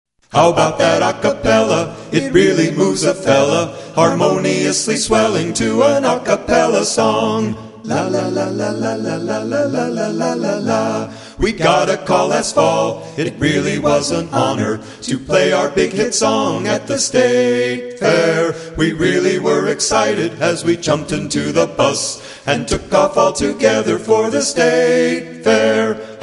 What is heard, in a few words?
acapella